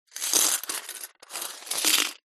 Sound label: foley money coins in metal money box movement; zapsplat